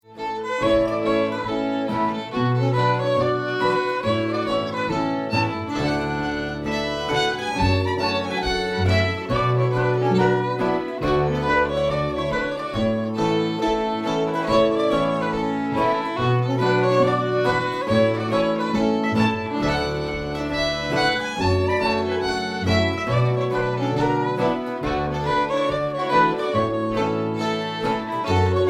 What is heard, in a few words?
Cello; Maryanns Cello